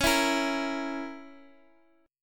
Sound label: Db Minor 6th Db down Guitar Half Step Down; strummed